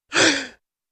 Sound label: Single Gasp inhale